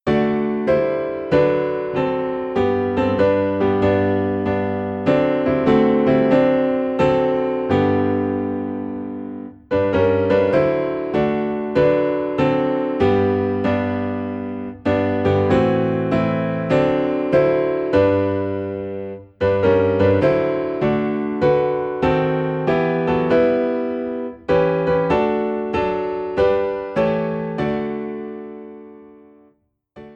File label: Piano in G; In My Heart There Rings a Melody